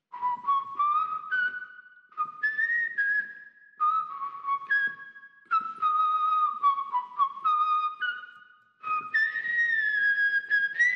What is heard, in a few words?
Whistle